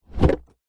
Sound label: board game box open; telstrations